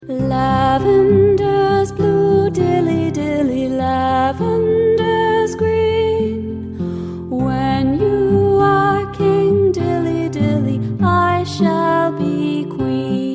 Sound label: lavenders blue vocal; folk